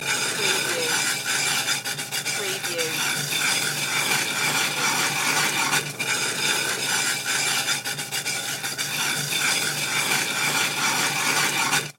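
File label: Scrub Pan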